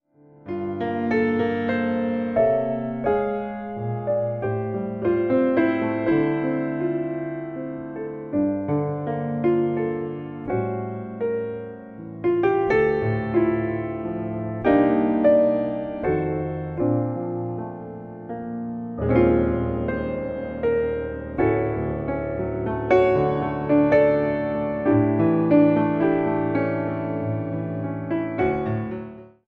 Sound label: waltz